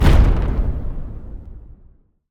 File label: block Explode; Mindustry; explosions